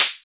closed-hihats, techno; Techno ID; single-drums

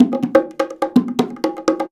Percussion Loops, Bongo & Conga; Houseworx

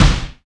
DMA SP-12; 90 s Sample CDs; Sound & Vision - Gigapack I CD 1 (Roland)

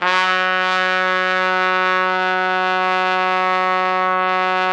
F#2, Pop Brass

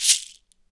SHEKE, Afro Shakers, Shekeres

